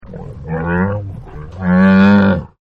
Camel Voice; Camels; Partners In Rhyme

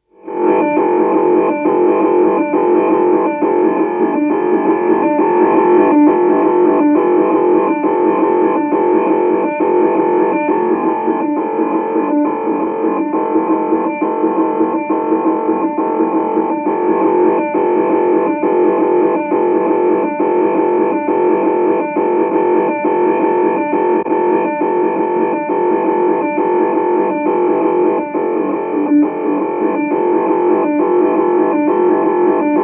Electronic Signals, Computer atmosphere; Computer Sound Effects; BBC

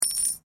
Coin Penny Single Drop Stone; Common coins; Money; Objects

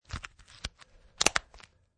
Buttoning Metal; Buttoning up metal buttons on a leather wallet; Snaps; Objects; Michael Huang

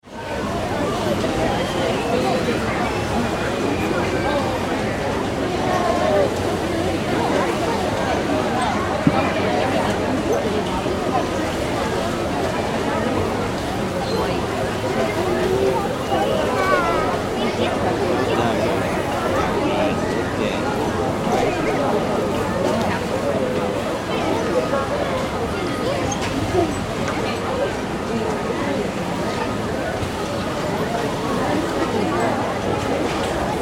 Ambience Fair Grounds Rides Crowd Machines; Amusement Parks

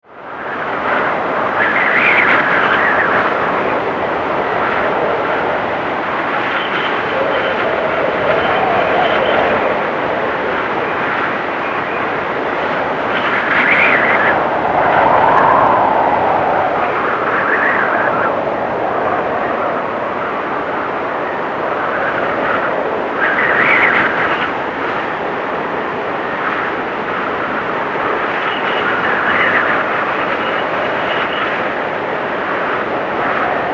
Wind Storm Cold; Wind, Storm, Cold, 5.1

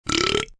Burp Toad, Burp, like a toad (human voice); Burping; VoiceBox

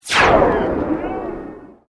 Sweep Medium; Created sweeps, swooshes and other movement effects; Sweepers (Sound Effects:Sound Design)

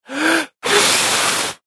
Person Breathe In And Blows Out; Breathing; Human; McKinney Sound

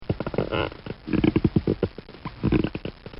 Cartoon Sound Effects Squeak; Cartoon Sound Design

